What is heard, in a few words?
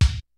kicks snare, kid; Kid Nepro; Elite; KICKS SNARES